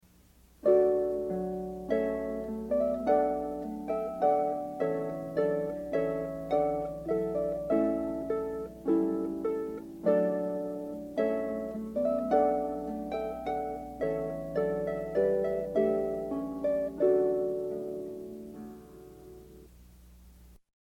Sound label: trumpet voluntary